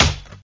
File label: Ball pop